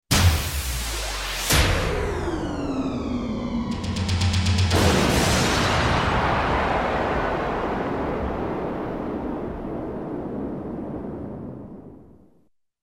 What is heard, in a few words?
POWERSHOT